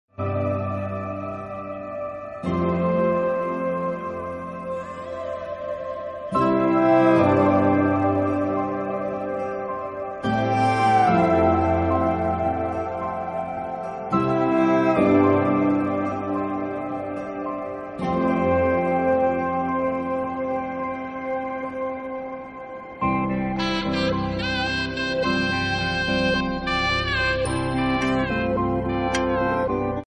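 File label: background music ringtone; Thappad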